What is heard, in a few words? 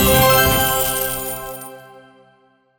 confetti; moon-bix; crypto-miner